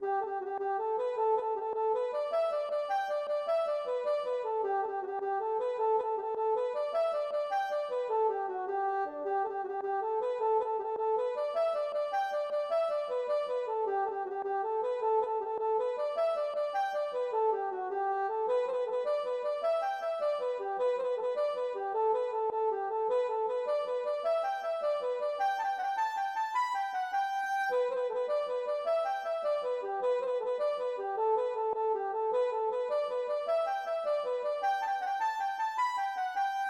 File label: jig